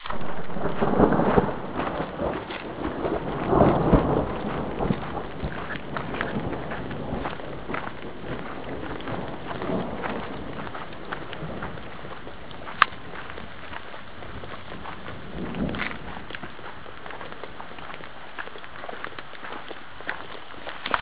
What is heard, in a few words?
thunderstorm